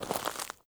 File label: FOOTSTEP Walk Trainers Compact Gravel Slow; mono